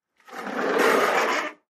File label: Sliding glass door is open and closed.; Open, Close Door, Sliding Screen, Sliding